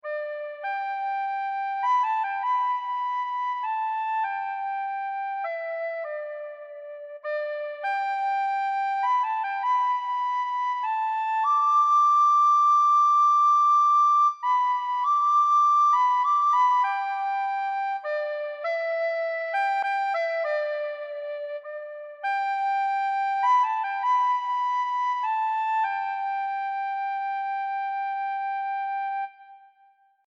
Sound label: recorder notes